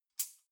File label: cutlery knives handle short